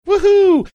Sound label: woohoo